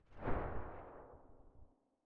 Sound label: silencer dvl10 far